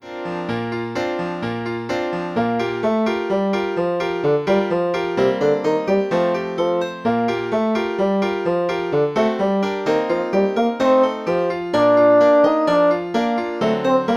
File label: Promenade Bassoon and Piano